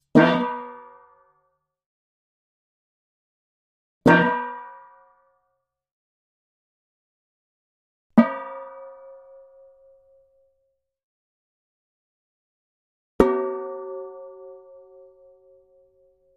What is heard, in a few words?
Pot, Saucepan, Hits x4; pots & pans